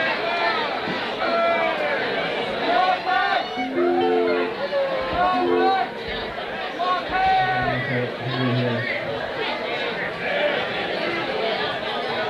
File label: Tuning; Burlington, VT; Nectars